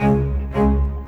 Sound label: Rock Pop Bass Cello, Orchestral; Sonic Foundry (Sony Creative Software); Orchestral Series VOL-4 - Rock and Pop